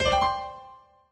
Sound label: notify; Golden Crayon Sounds